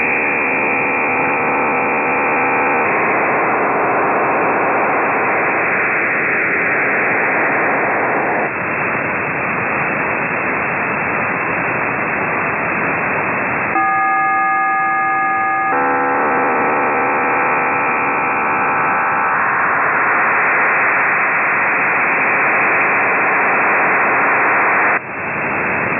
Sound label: isr navy hybrid modem